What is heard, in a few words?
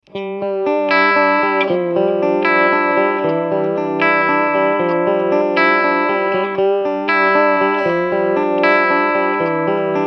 uv clean chords mod half speed Vibrato mode full int